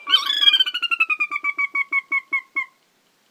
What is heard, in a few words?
Sora, Porzana carolina; shorebirds rails